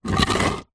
concrete block small move scrape on dirty concrete